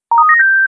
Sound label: sms received; ODST